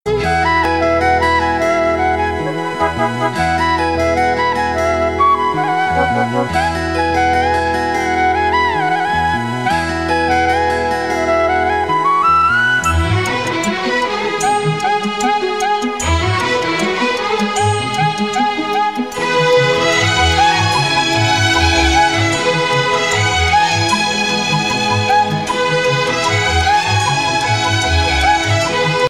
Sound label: best flute; tamil bgm ringtones